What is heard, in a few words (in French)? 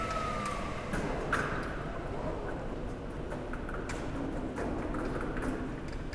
swing lessiveuse; Avec vues sur terrasses